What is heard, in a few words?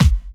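snare drum bass drum, Dance Mega Drums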